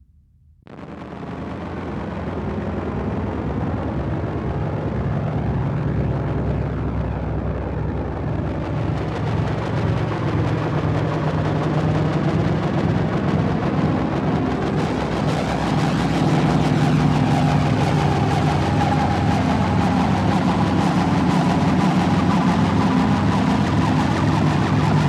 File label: Clean Noise